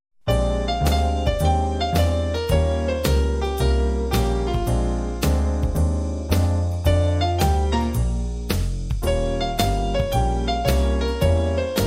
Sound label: Groove Blues